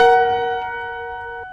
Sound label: piano sound merged